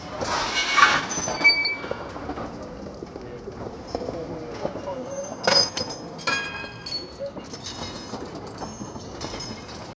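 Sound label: underground coal mine ambience, metallic clinks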